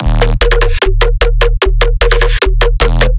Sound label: bass loop 150 D#; Virtual Riot